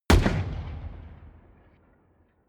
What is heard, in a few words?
shoot distant